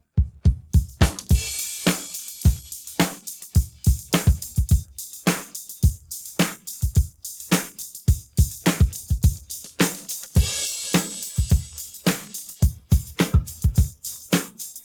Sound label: pattern, drums